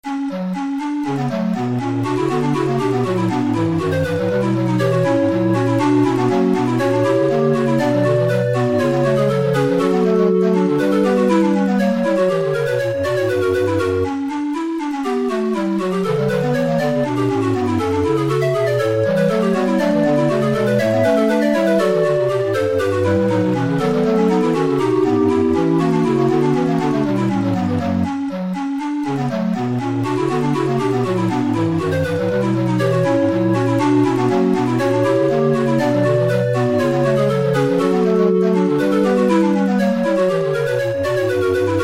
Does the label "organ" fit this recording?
Yes